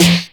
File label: Snares; Vengeance Samples